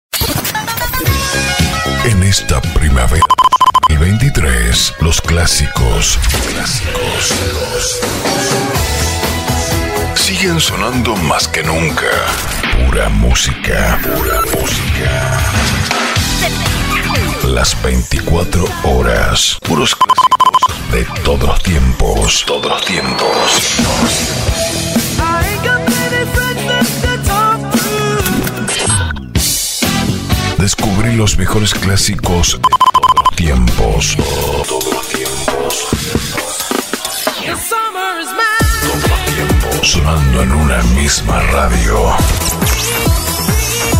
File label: PRIMAVERA VINTAGE